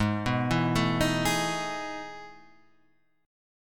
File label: E Major 11th chord